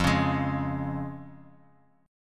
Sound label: F Minor Sharp 5th, Guitar Open D, chord